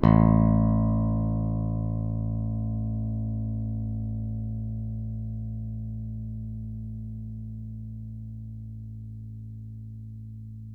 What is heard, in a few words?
pick